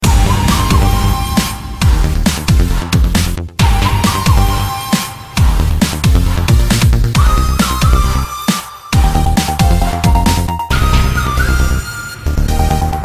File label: Electro